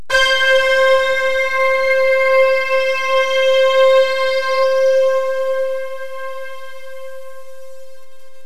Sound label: synth misty c4; Terra Sound Library; construction set